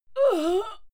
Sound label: female fight pain muted, Screams; schreie; menschen